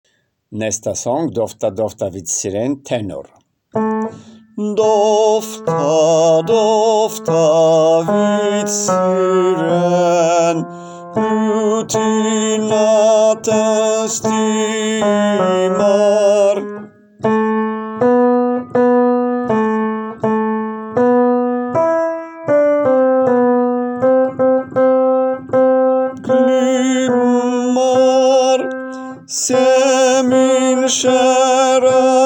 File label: tenor